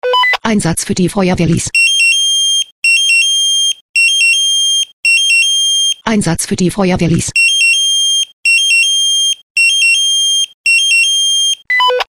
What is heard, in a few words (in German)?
SMS Alarmierungstone; FW Lyss